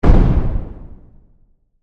Magic Wand Cast, Horror, Magic, Wand, Cast, Spell, Heavy